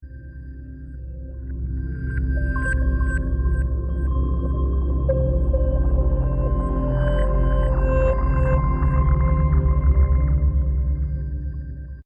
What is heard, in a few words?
End Recap Loop